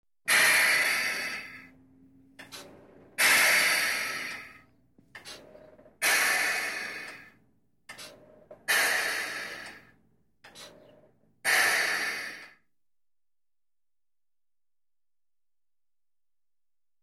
Car Disc Brake Test

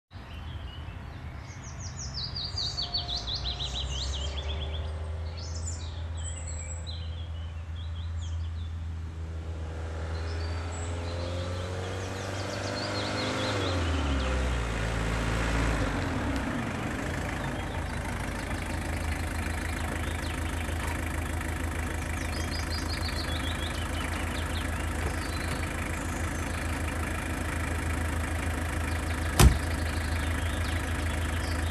Car parking with nature atmosphere

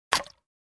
Beer bottle opening

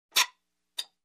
Hacksaw Squeak

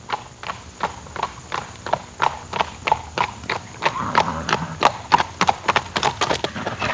Horse canter sound effect

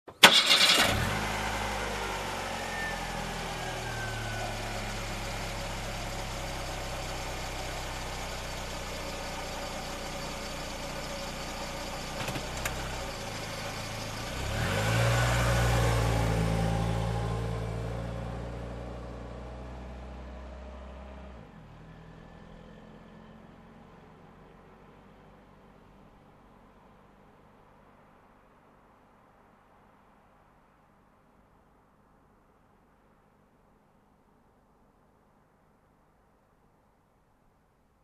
Car engine ignition and drive away

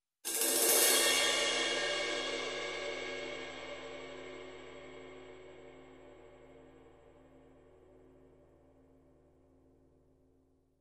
Cymbal Swell

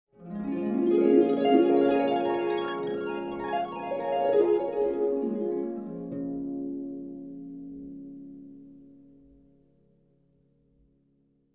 Dreaming

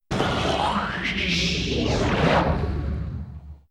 Mythic sea monster sound

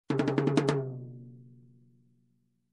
High Tom Thrill

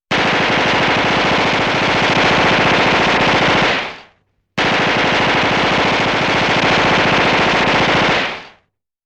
Gatling Gun

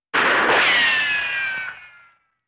Bullets Fly By

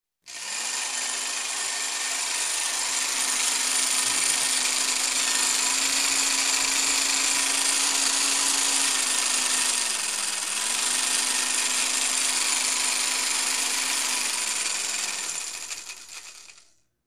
Cutting Steel Blade Noise

